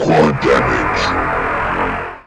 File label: quad start, quad damage; garrysmod; weapons